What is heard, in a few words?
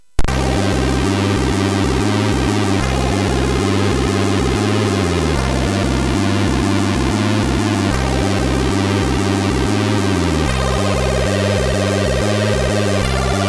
Chord, MOS6581; MOS6581R3; hvsc